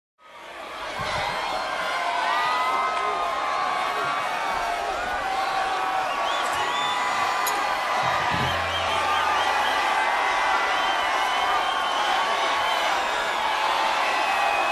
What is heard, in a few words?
Crowd cheering and chanting